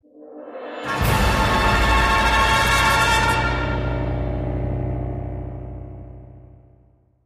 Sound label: Combat Heavy Intro; Darksiders